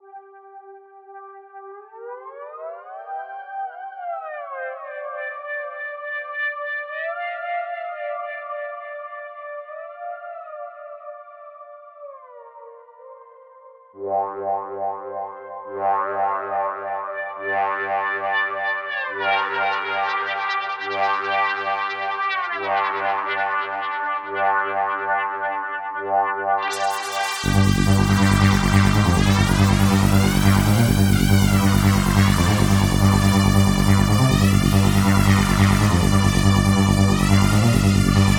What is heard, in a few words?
lead space reso